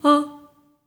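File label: staccato D4, soprano, Voices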